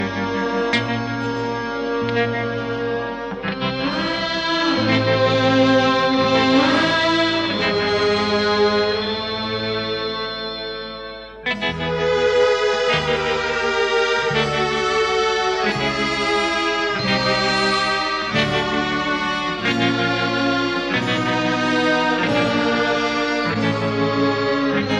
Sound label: Instrumental